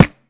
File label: sharp snare drum sound f key; snare-drum-samples